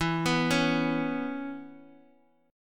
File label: E 5th 6th; E56 Chord {12 14 11 X X X} arpeggiated